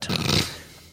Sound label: snorting sound